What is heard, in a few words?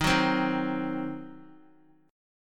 D sharp Minor 6th D sharp down Guitar; strummed; JGuitar